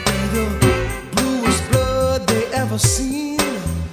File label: music; wu xing; earth